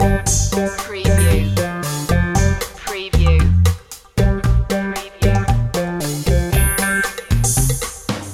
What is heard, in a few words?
playful wah wah loop